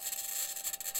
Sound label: mic, mars2020 edlcam